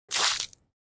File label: Vomiting splattering on carpet